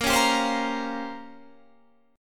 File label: Bb Minor 6th Add 9th Bb down Guitar Standard; strummed; JGuitar